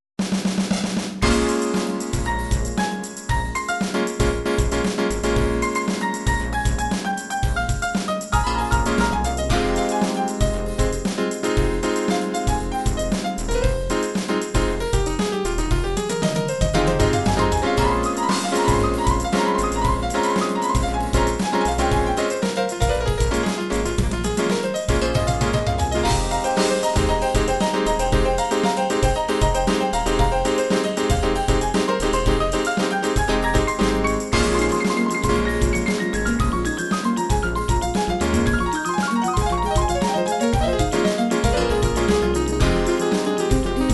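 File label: dance techno big piano, midi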